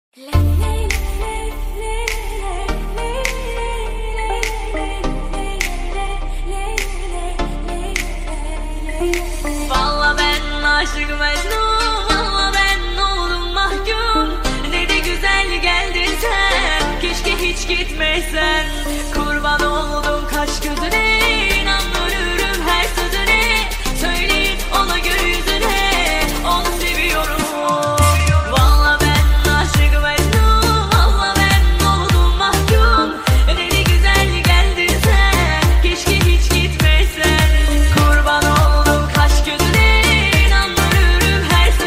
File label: Arabic Remix Bass Boosted; Tik Tok training Song